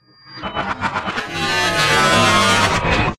zvuki kontsovok outro ischezanie zatukhanie

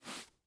zvuki vafelnogo polotentsa

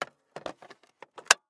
zvuki ruchnogo pylesosa

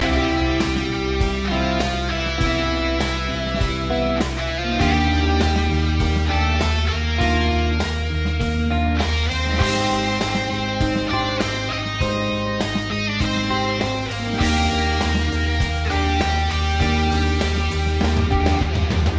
zatsiklennye melodii dlia gitary loop

